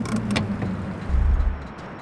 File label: stop, vehicles; schafter